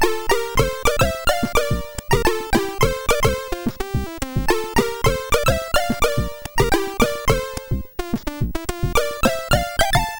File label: Intro, sid MOS6581R4